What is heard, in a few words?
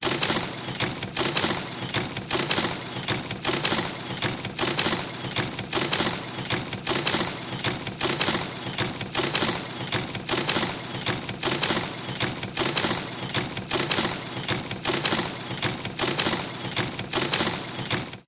industrial machine mechanical clunk whir repeating process operate conveyor etc